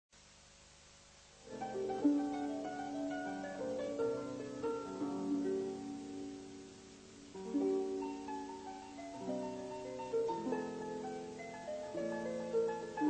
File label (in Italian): Intervallo